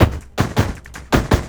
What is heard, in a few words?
LOOP, 160 BPM, Drum & Bass